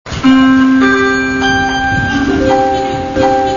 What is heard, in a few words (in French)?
jingle gare coupe